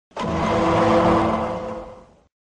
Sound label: sound effect; law order